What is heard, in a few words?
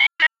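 sphere blips medium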